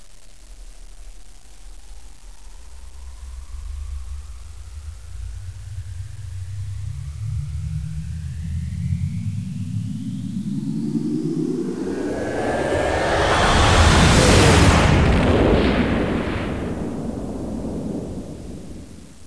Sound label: WARP